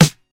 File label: studio snare d sharp key; studio snare sound; snare drum samples